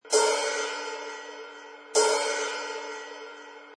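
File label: Loop Cymbal Two Hits 130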